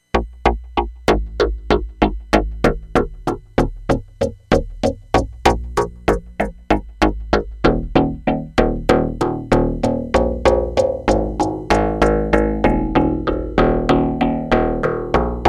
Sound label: ext fbk phaser